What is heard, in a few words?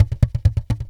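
tantango groove; Best Service; World Colours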